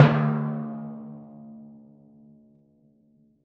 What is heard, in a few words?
D2 f, Timpani, forte; PowerFX; Downtown Orchestra; scales